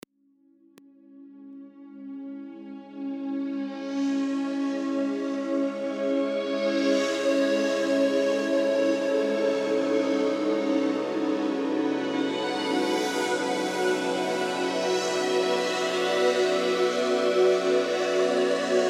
unknown space ambience

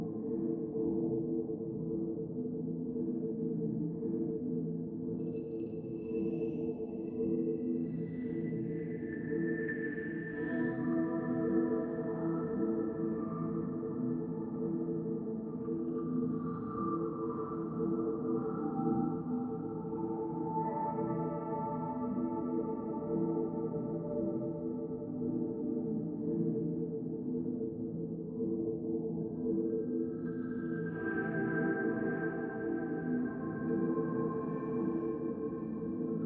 wastelands loop

